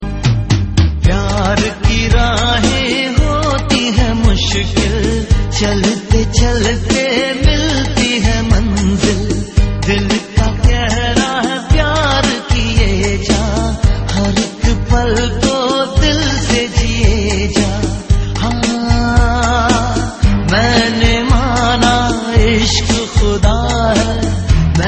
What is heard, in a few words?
pooja song tone